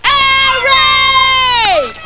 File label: air raid